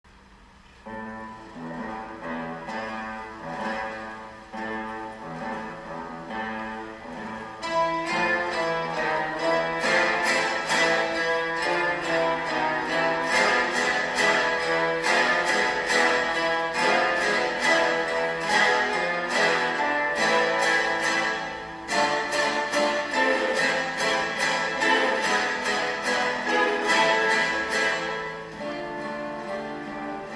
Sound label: Tango Argentino; Musica Latina Facil